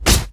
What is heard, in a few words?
Axe impact; 100rogues